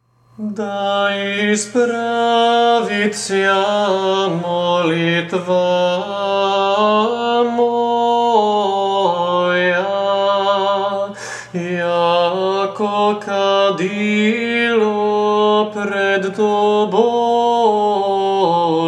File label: Da Ispravitsja peti glas, Serbian chant